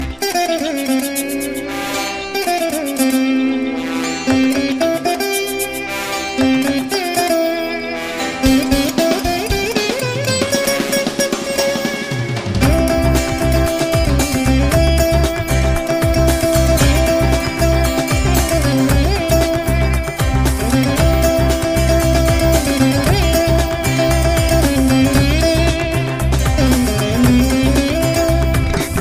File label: Soothing Veena